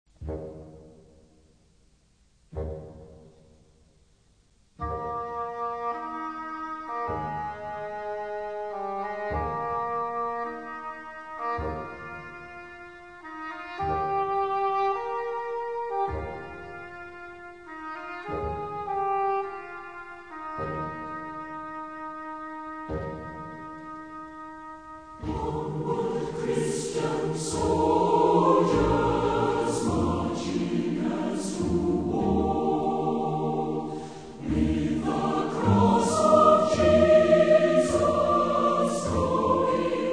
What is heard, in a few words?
Orchestra and Choir